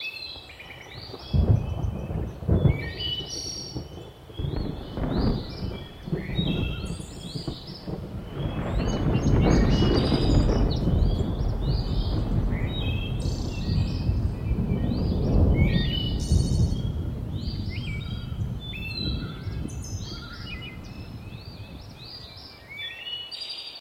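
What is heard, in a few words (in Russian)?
ветреный день в горах с поющими птицами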